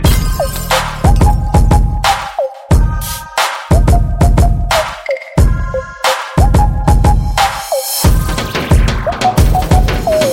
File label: Beats